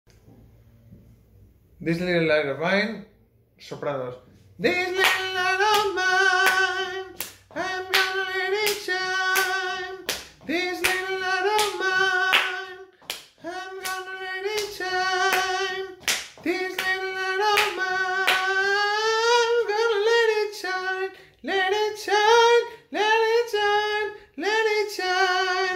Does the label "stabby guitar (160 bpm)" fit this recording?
No